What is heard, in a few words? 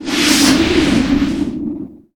magic faeriefire miss; wesnoth